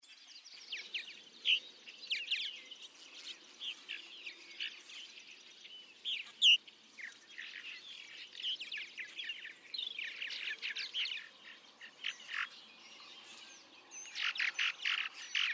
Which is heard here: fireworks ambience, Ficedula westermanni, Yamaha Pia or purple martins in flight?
purple martins in flight